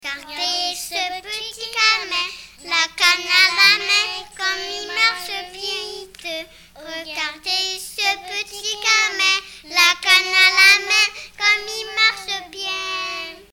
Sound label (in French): gamin, chants